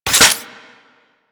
Reload, Weapons; Strun Prime

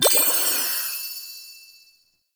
Vendor UI Open; Warframe; Nokko Colony; Vendors

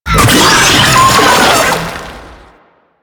Infested Attack; Enemies; Vocals; Warframe; Proto Infested; Brachiolyst

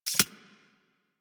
Arachnoid Micro Step; Warframe; Corpus; Steps

